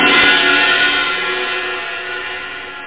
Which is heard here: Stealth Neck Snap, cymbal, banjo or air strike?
cymbal